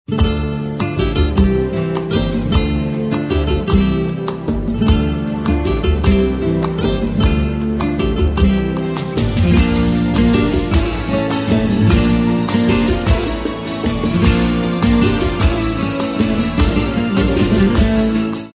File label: Ringtone